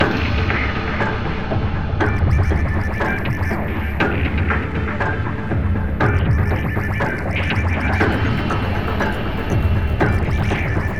Water Purifier Loop; children of morta; Procedural Events

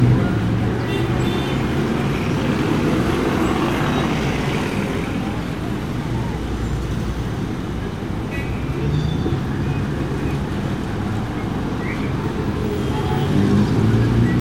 Test Ambience; batman the brave and the bold the videogame; wii

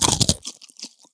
Eating With Chewing

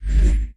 Interface

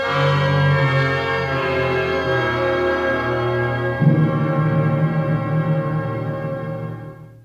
Puzzle Replay Wrong answer

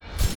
Ring Appear

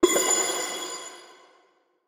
Coin Hit; we are the dwarves gamerip 2016

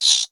rat sqeek

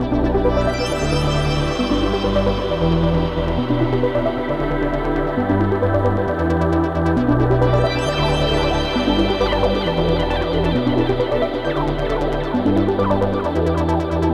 Music Forest Menu; sayonara wild hearts 2019 gamerip

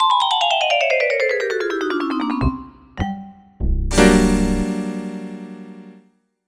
Lose

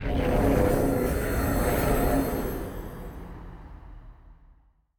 Field Mat Tap; Yu-Gi-Oh! Master Duel

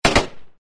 Enemy Snd Fire; Acid Rising

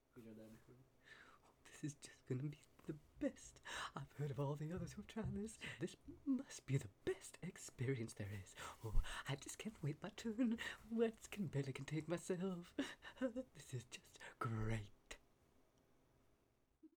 Male Idle

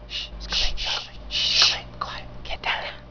Shh!; Buggy; PS1

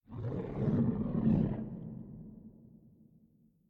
Creature; We Are the Dwarves

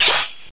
Pain Split

